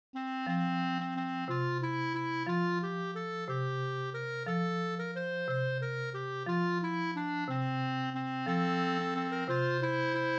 lied van de maand Geloof je dit echt melodie

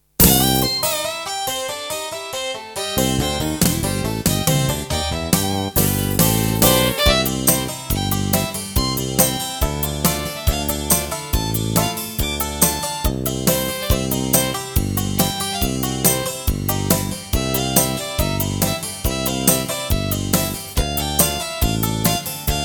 karaoke

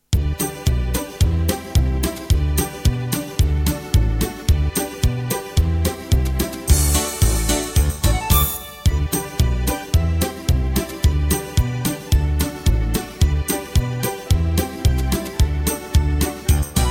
gevulde speculaas karaoke

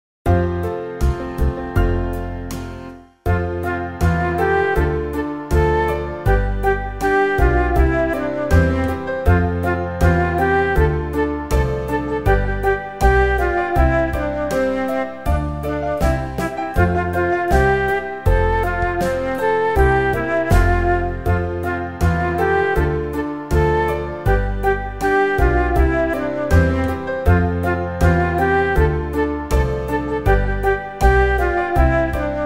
melodie

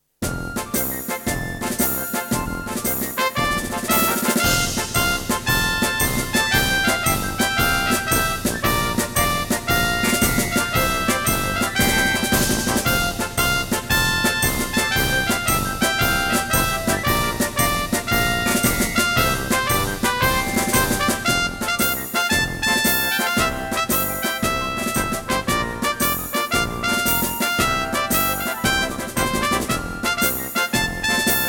karaoke

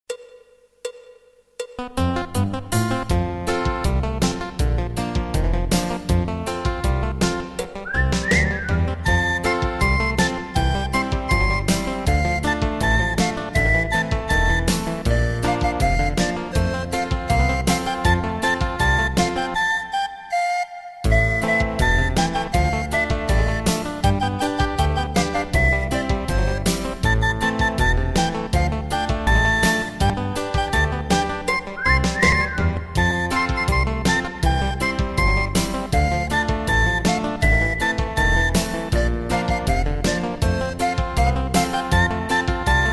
melodie

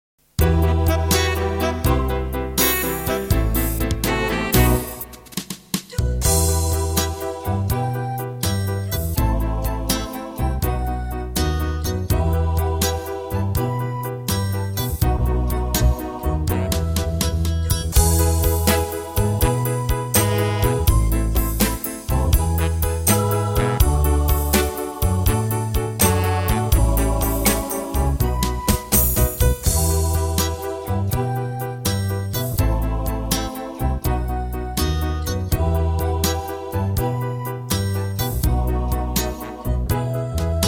karaoke

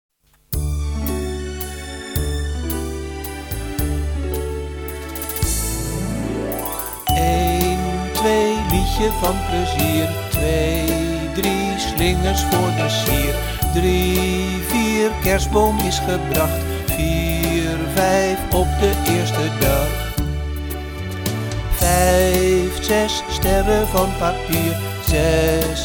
Lied van de maand zang

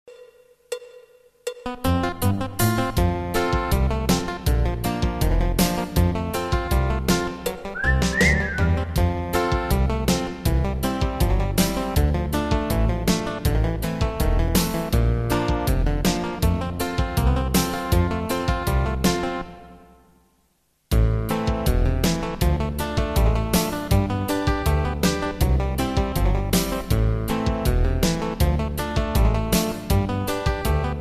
karaoke

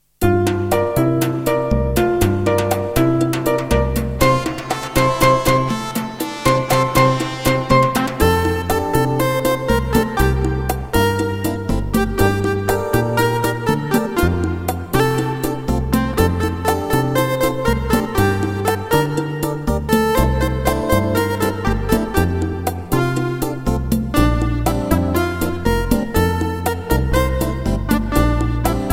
Websint melodie

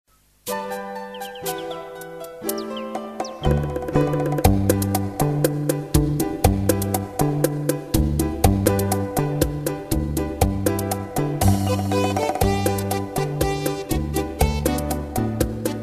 Spinnenlied melodie

